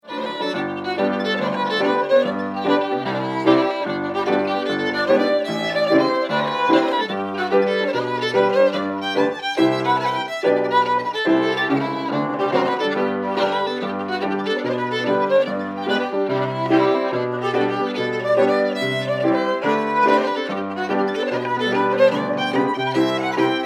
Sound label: Hornpipe; Eannas Hornpipe